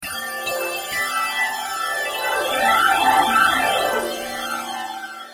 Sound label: Frozen Bells, V-Synth